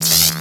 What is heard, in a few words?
welder